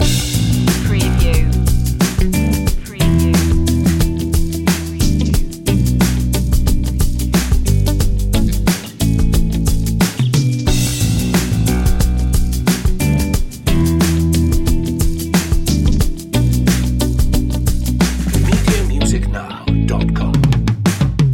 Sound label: slow funk reggae loop; care-free